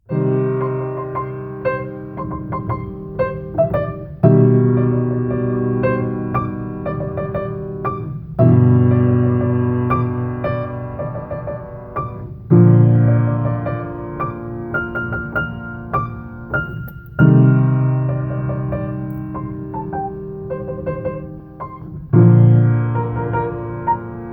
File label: piano; improv: Piano